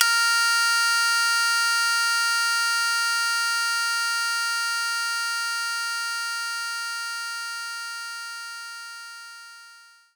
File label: Shamisen C3; Knights Try